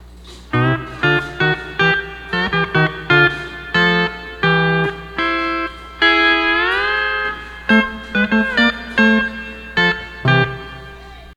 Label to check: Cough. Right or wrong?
Wrong